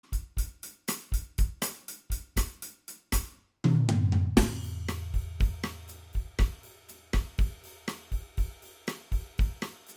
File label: Brazilian